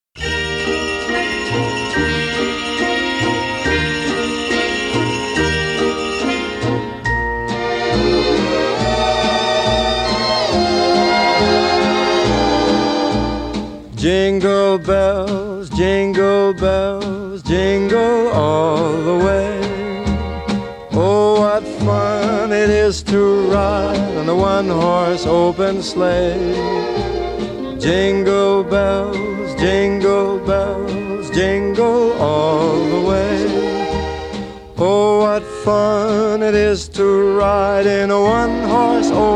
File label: Jingle Bells